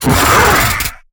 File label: Pain Light; Evolve; Gorgon; Lurker